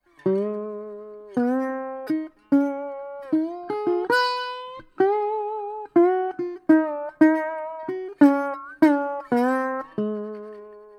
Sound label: Reso Dude 6 string